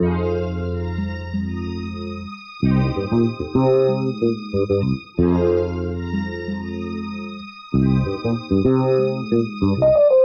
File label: keys sultry; loops; Phonky